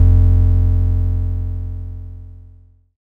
Bass, Synths, 5U Modular

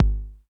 KIK KIK, TR909 Kicks; Club-50 - Foundations Roland; Cheez Kiks

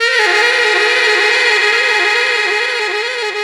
Dubby Sax; Effected; Sonic Foundry (Sony Creative Software); Jazz Solos and Sections

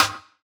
Rim, DRUM SOUNDS; ORIGINAL JUNGLE BREAKS

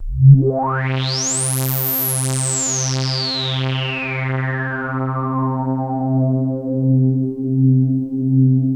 RM20 RESO C2, BASS; Q Up Arts; Dream Experience

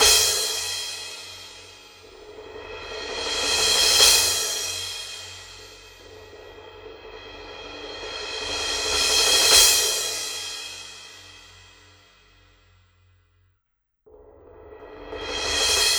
Cymbal; Sonic Foundry (Sony Creative Software); Orchestral Series VOL-4 - Rock and Pop

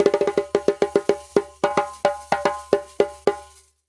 Djembe with Kessing, African

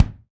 BD, Roland; Sound & Vision - Gigapack I

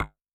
GUMY BASS; Yamaha DTXpress-II

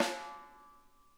SNARE, Drum Kits

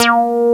BASS ZOW, ANALOG; FAIRLIGHT CMI IIX

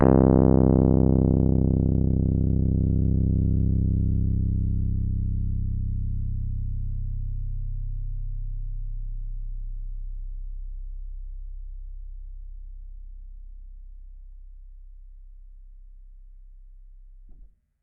C1, Rhodes Mark II; mkII 88 key HI; CEPCo Sample Series Vol. 3

